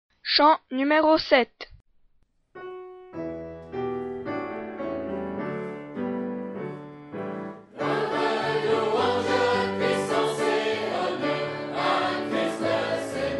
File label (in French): Chant; FR